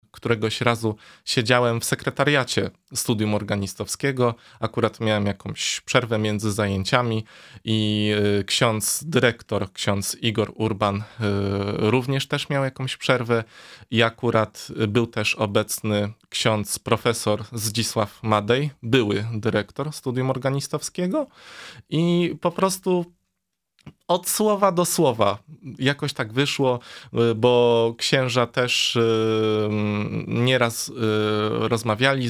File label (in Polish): organy; Letnie Prezentacje Organowe w kościele sw marcina; Wrocławiu